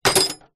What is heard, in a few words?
industrial small metal hand tool drop into metal tool box